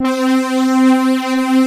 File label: OB STRINGS; Pro-Rec - Synthsonic; JV ANALOG2